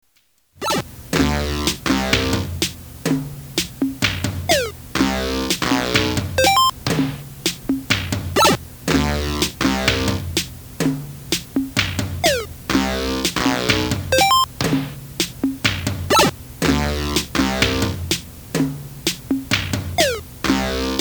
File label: bass